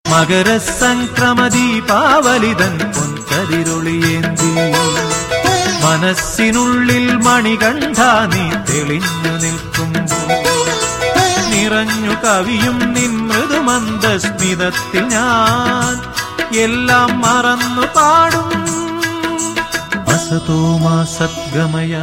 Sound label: Hindu Devotional